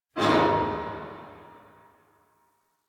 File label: Parking Lot Metal Door Distant